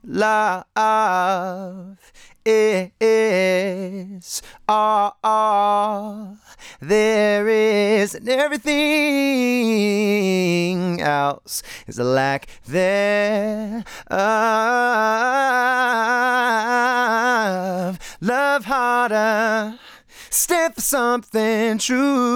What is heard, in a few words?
Vocals; slatedigital; fg-stress